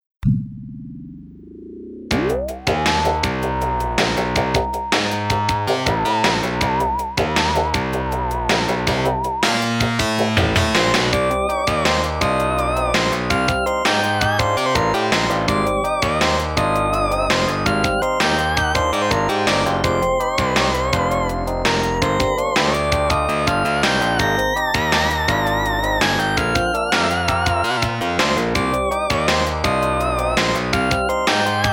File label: load bgm; chronicles